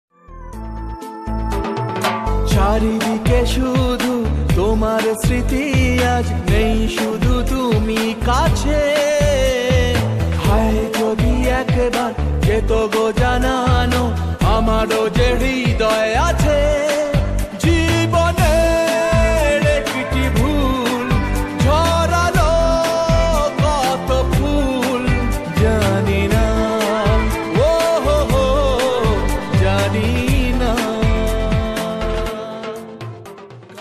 Bengali Song Ringtone

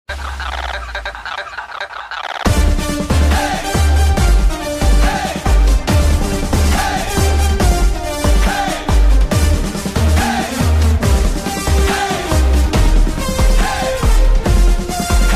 BGM Ringtone Cuckoo; ringtones

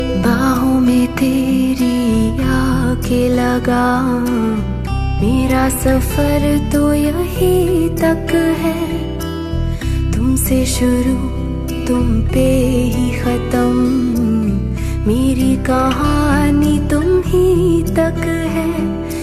New Romantic Hindi Song Ringtone

